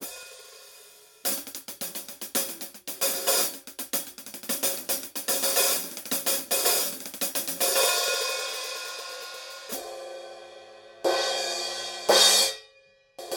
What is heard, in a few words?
Paiste PST8 Hardstick Set